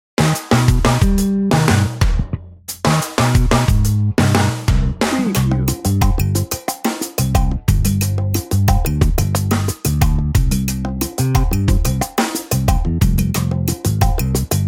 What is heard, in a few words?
salsa party dnb